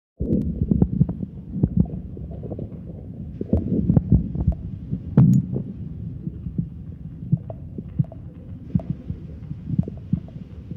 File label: interlude; bloup ca digere